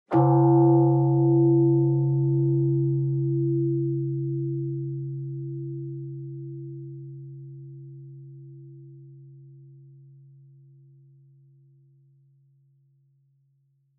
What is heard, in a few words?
Bonsho Low